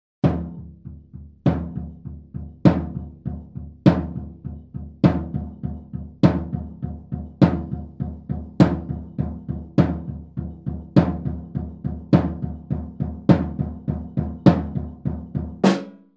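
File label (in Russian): барабаны индейцев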